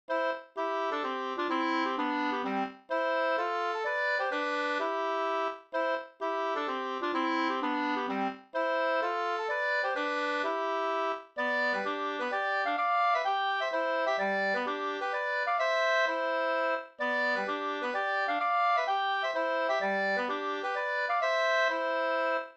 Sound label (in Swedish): polska